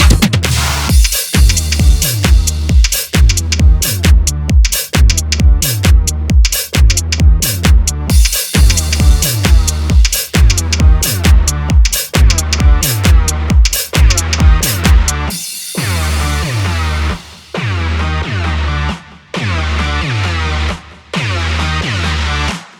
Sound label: workout music gym tabata songs dj edm house music remix